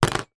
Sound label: foley metal clip small down on plastic surface; zapsplat